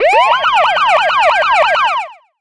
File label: HUD Difficulty Up